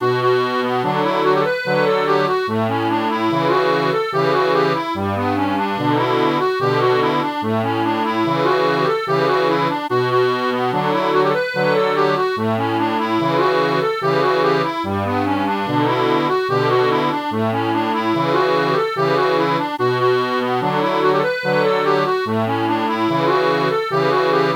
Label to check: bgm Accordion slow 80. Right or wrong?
Right